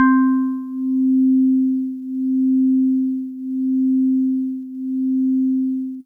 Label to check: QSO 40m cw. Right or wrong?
Wrong